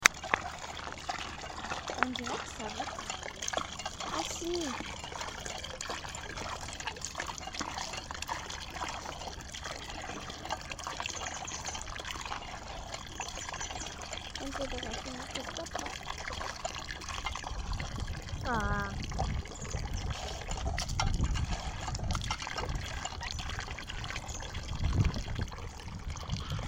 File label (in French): pompe a eau cour ecole